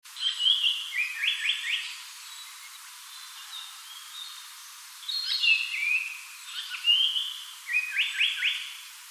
sanko

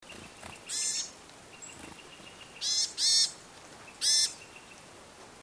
yamaga

